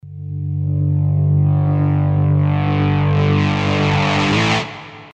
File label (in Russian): gitara dlinnij s drajvom